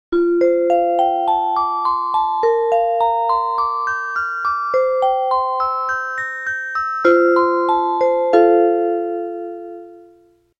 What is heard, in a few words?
chime; denon